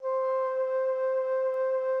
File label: Instrument